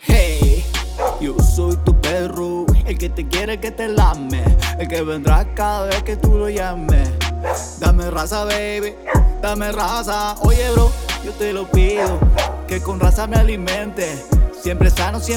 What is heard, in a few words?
Jingle; RAZA Alimento para Mascotas